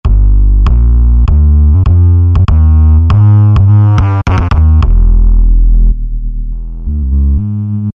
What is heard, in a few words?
W Shape Bass, Roland V-Synth; Factory Patches